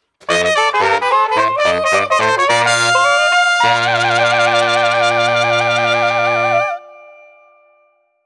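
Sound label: Street Brass New Orleans Transitions, 95 bpm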